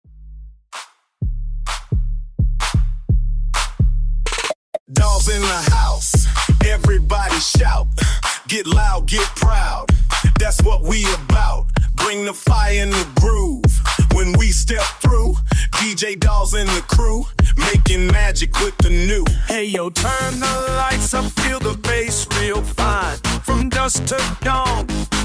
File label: 128 Bpm; DJDOLLS; ACTIMIX